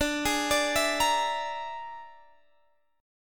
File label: Bb 7th Flat 5th D